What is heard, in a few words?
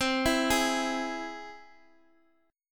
C Augmented C down Guitar Half Step Down